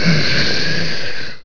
Death

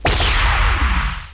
laser

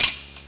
key grab; TYRAN

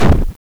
sword, knight

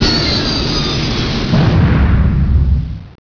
land

